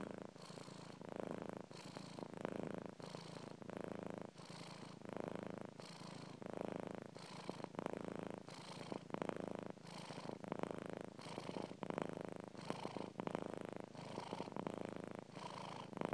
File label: happy kitty